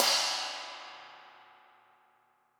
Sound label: Trash E, drum